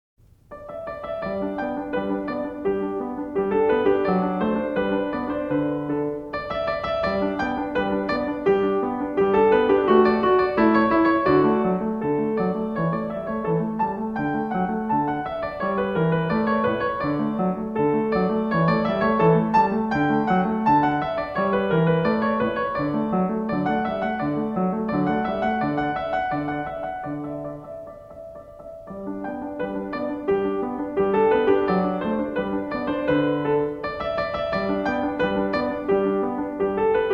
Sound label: Sonatina In G Major (Allegretto); Magrath Book 03